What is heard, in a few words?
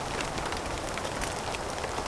Rain